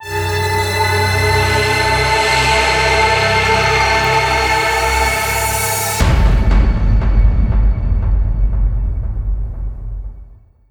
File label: spooky